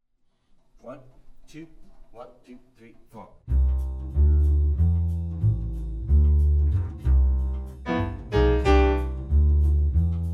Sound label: latin etude rhythm section drums muted